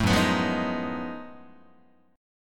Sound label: Gb Minor Major 13th Gb down Guitar; strummed; JGuitar